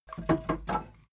wood board handle roomy; objekte; holz

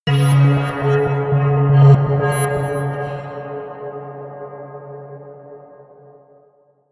melodic metal movement low, drones

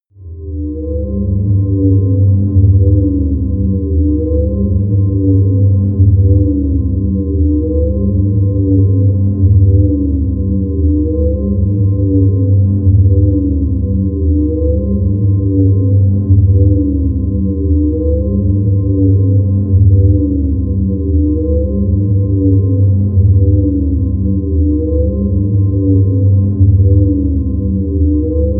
drone swirling

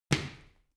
medicine ball catch, fitnessstudio

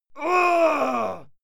male fight scream pain; schreie; menschen; soundpool

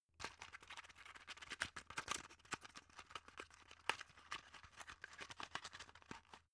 drug open shaky; arzt

